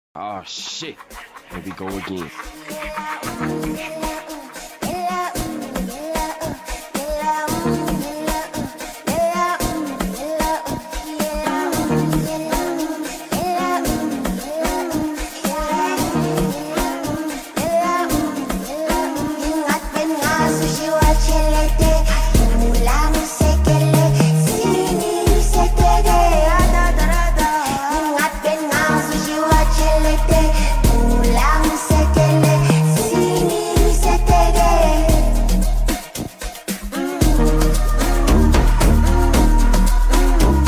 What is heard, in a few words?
amapiano